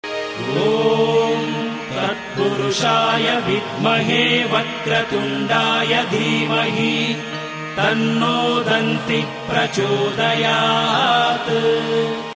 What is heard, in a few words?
ganesha (tatpurushaya) gayatri male